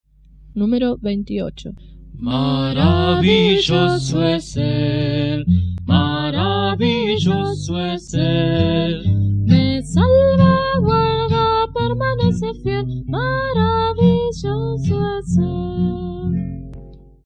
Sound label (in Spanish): Coro, himnario niños